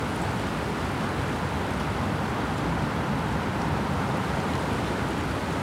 desert med wind; ambient; atmosphere

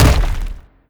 bash soft, brickwall, destruction

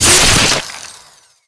manhack slice flesh; npc; Half Life Alyx

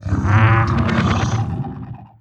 dry, strider idle; creatures; combat; vox

